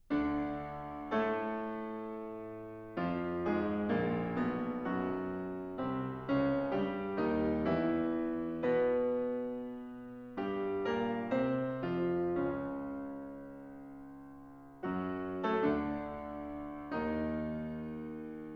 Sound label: Suite for Horn, Tuba and Piano; Suite No. 1